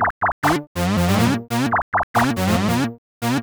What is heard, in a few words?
SNTH BASS ELCTR 140 A, Techno